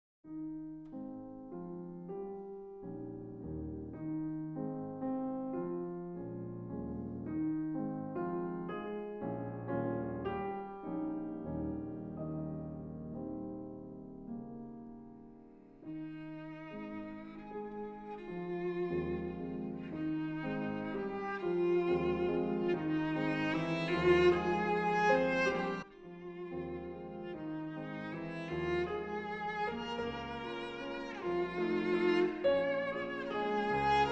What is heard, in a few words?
Cello and Piano